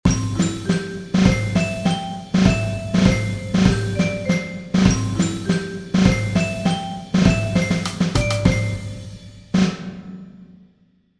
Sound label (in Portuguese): Toque; fanfarra